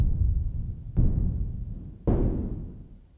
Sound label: SYNCUSSION